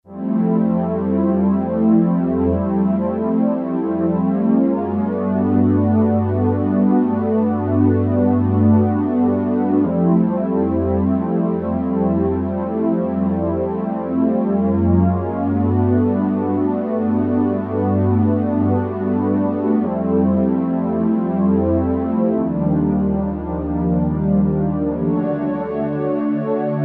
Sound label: THE SOFT PAD, Roland SC-880; Factory Patches